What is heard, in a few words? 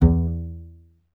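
string contrabass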